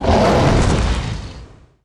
Magma Hook death; IgnisVolcano

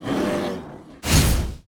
Temple Ogre attack; Arenill

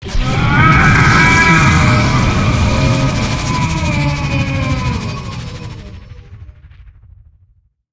nightmare death

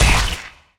Ashes Butcher damage

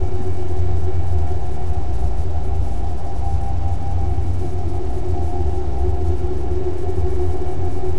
boss global

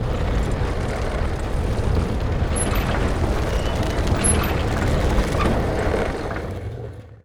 Mine Pulley Down; KuberaMine

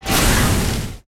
attack; curserich

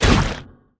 Raised Gladiator damage

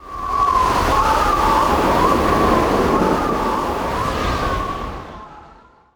tornado; TormentaIsland

